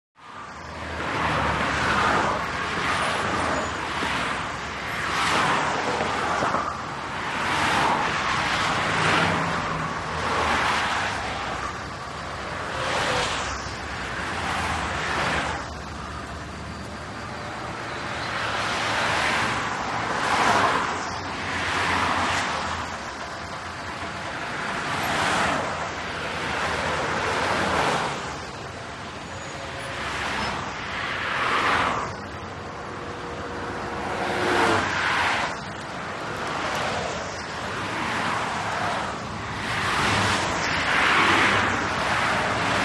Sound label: Highway Traffic Bys, big city